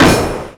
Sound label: fall